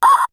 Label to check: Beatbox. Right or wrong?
Right